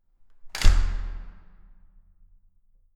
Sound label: entrance door slam